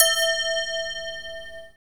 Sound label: BELL; E-Lab; X Static Goldmine 1